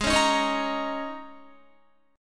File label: G sharp Suspended 2nd Suspended 4th, G sharp, down, Guitar; strummed